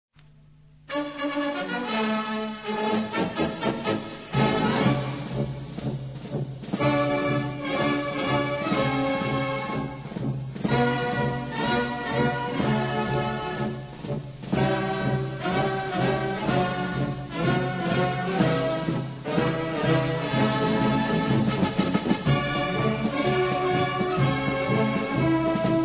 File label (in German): marsch; der 8 division; mantegazzi gian battista